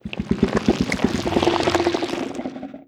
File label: idle subtle, barnacle; Half Life Alyx